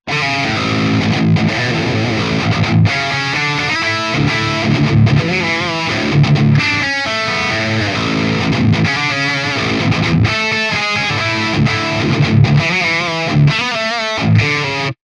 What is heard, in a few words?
Djentle & Bouncy